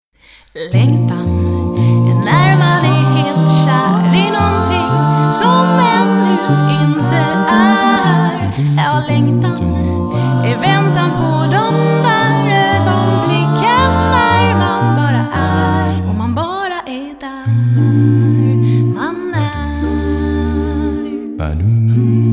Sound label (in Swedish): musik